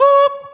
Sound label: boop, Voice; ooh ahh